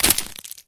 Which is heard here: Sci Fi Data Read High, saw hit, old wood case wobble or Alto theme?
saw hit